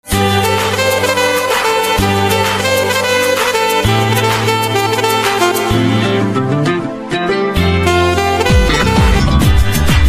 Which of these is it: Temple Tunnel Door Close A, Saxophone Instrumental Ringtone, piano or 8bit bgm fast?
Saxophone Instrumental Ringtone